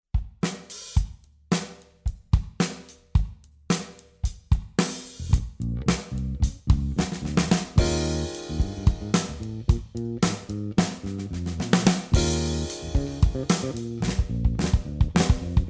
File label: Pop, Drum Fills; Essential Drum Fills; Erskine